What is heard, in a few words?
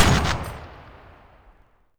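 heavy machine gun ringout; WAR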